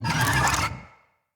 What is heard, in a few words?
Infested Big Ashen Vocal Pain